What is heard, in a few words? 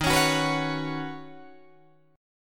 Eb Minor 7th Sharp 5th, Guitar DADGAD